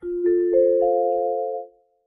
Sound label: announcement 4-tone up smooth quick